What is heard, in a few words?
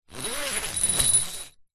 trampoline net zip open or close; sport; zapsplat